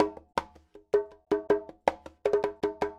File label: Conga, Latin Percussion